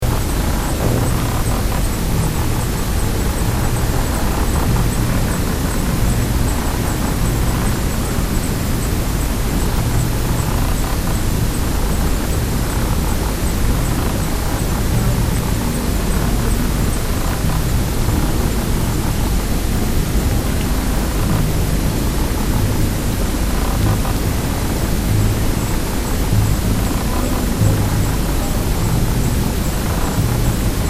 Lizards, fiji

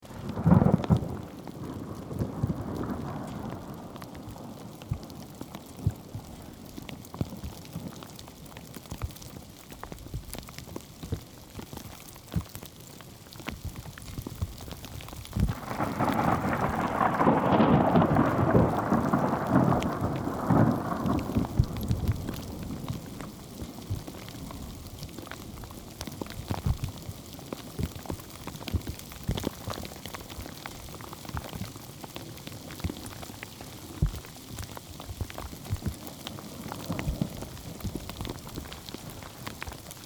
Nodar Portugal Thunder And Hail

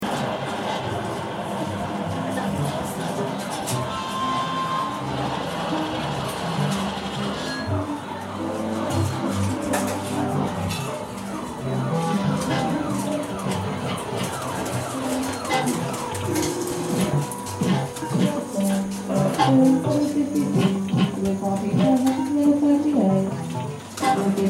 Glasgow arcade

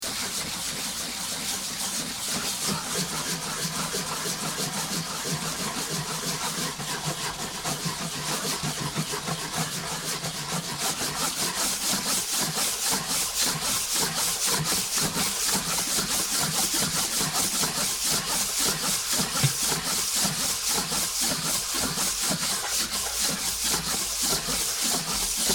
sanding

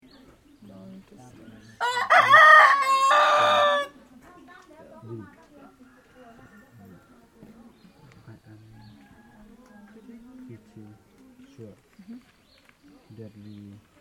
bus stop cock

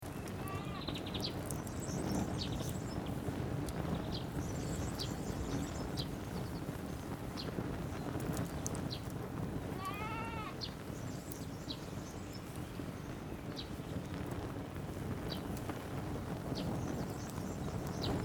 Nodar Portugal Cable Fire

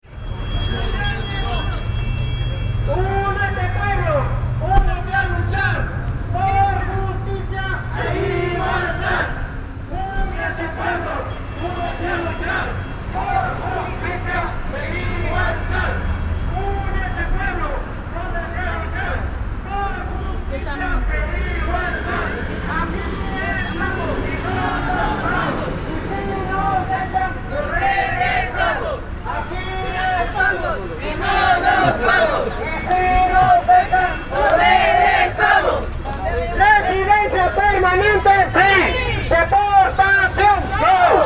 Protest

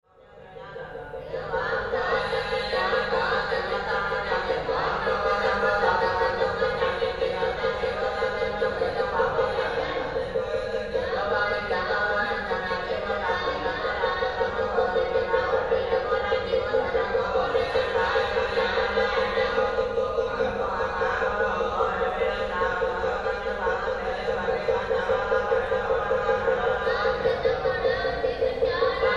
Chant

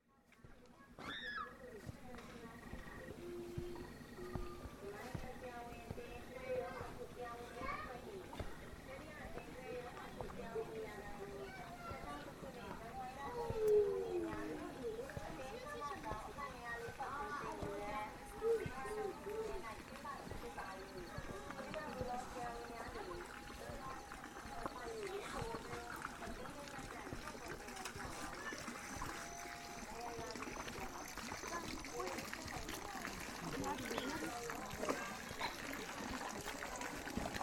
Village pump Shan state Burma

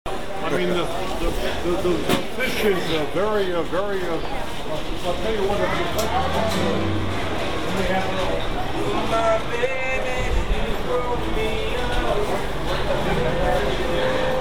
Pike market

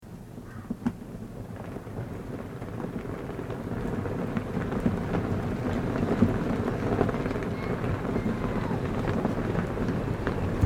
prayerflags flapping

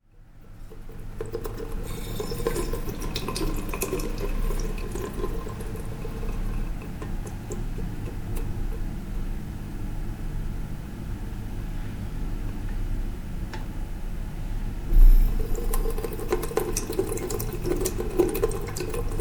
Faucet, vietnam

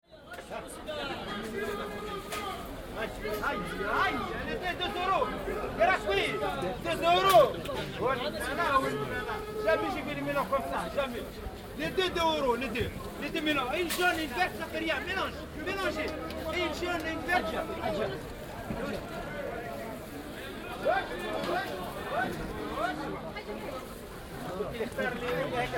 market

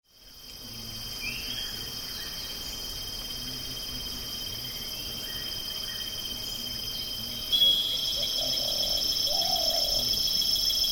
electric cicada, nepal

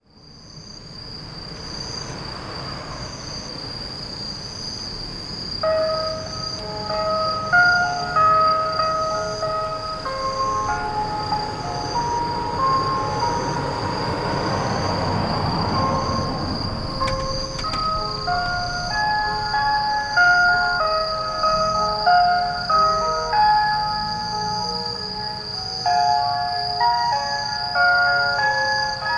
Chichibu Japan Six PM Broadcast

